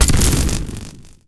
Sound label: rope launcher